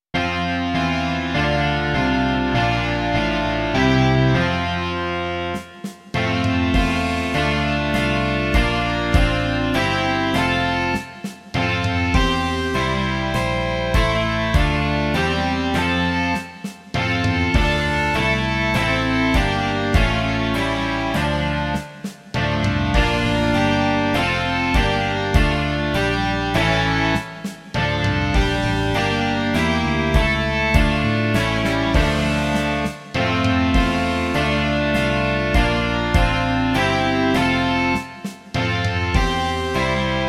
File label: rock